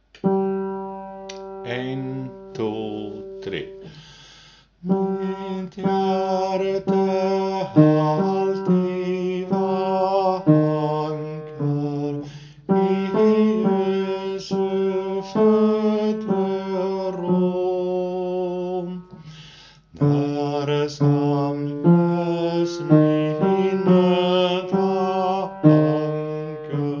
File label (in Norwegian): mitt hjerte 2 tenorer